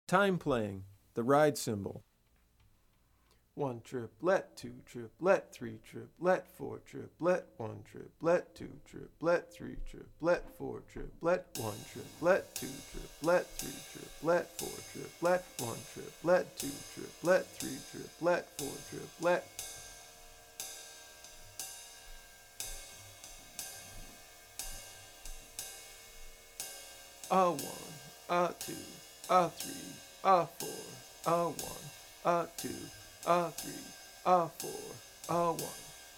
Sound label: Phrasing, Bop Drumming; The Art Of Bop Drumming; Riley